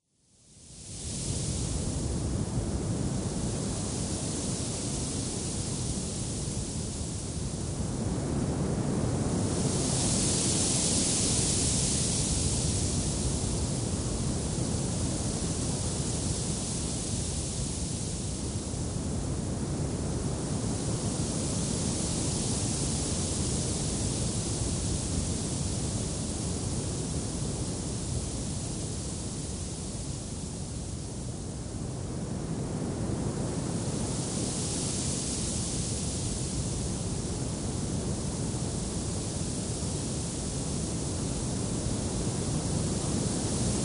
kaze kusa

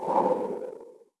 kokyuu ha

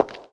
ashioto

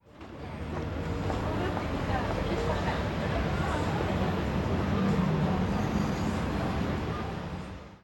繁華街

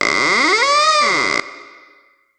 ドア開

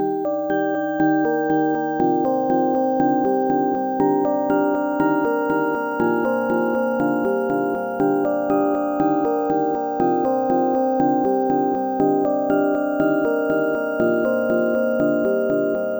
海のオルゴール; ゆめ2っき

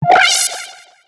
困惑

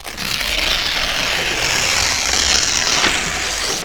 biribiri

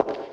ashioto hall

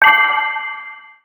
決定音 豪華版 ドライ

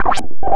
ころりん

